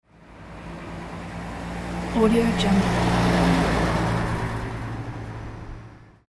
small truck; greenwaysound

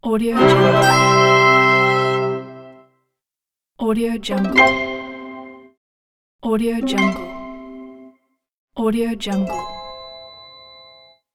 the victory sound

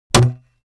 weapons sword hit wood

